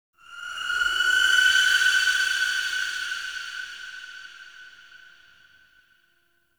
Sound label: Mind Burrow Enter Drill; Warframe; The War Within; Queens; Cinematics